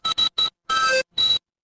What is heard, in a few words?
ui beep